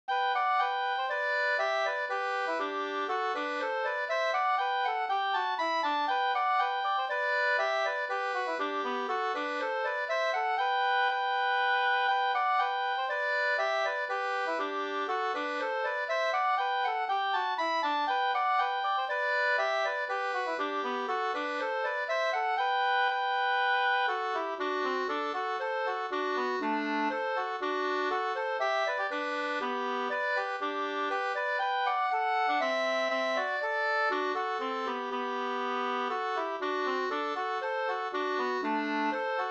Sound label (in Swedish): hambopolkett, 2st; Tjolavippen e PF Lundqvist Ska